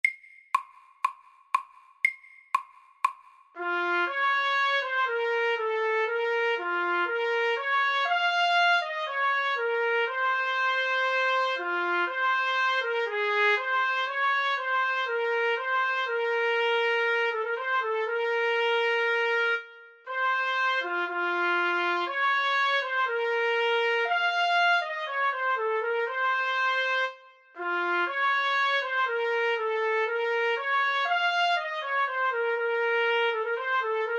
Etyde i C moll FORT

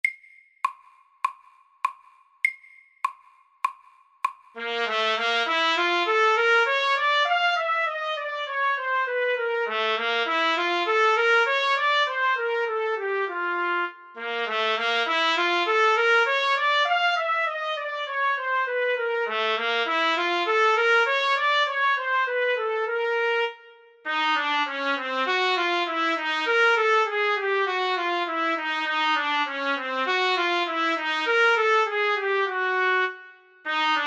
Kromatisk etyde SAKTE

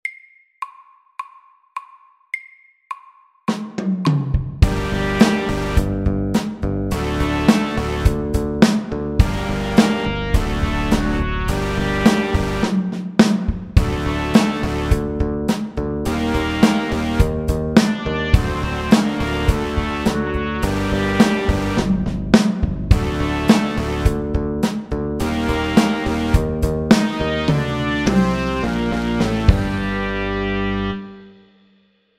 Elektrisk rock MIDDELS

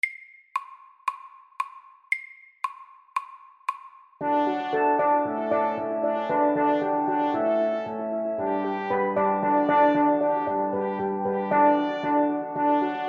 Steel drum band MIDDELS

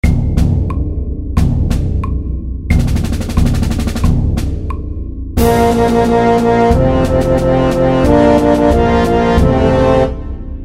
Fanfare og marsj SAKTE

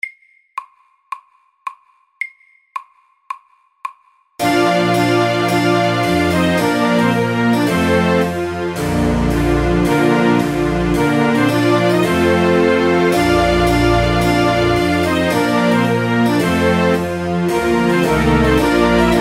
Trumpet tune MIDDELS

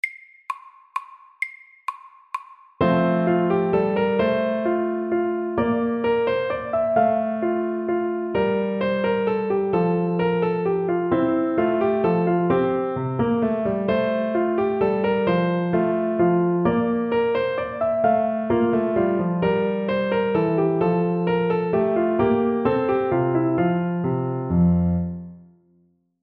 Menuett i G dur FORT

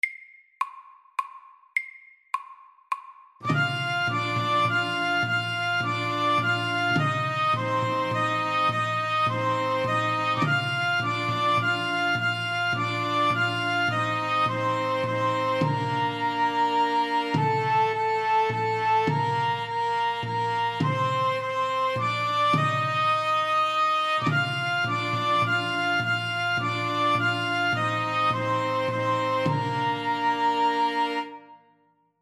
Irsk melodi SAKTE

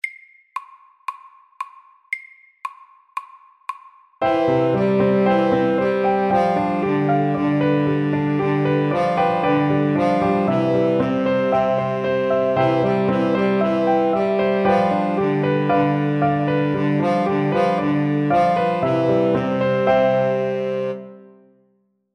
Walesisk melodi FORT

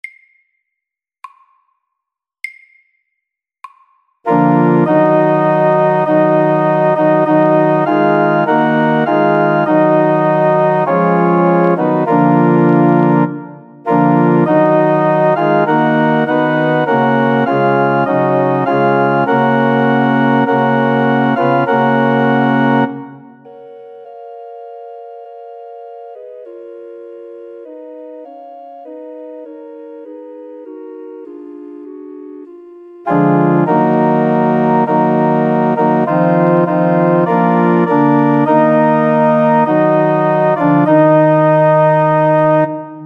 Marche funebre SAKTE

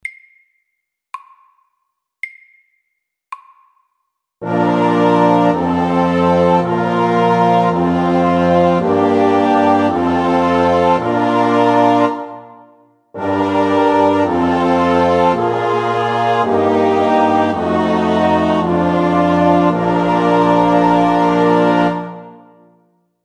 Kromatisk koral MIDDELS

